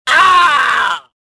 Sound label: death